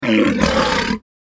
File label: Walrus Roar